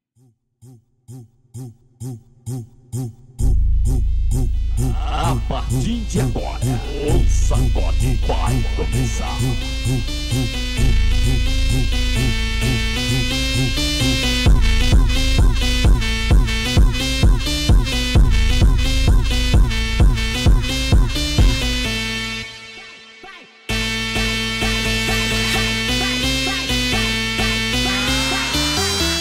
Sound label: Phonk Montagem